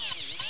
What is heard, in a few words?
hand lose